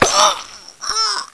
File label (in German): sterben; Tomb Raider PS1; lara